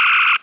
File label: ST LASER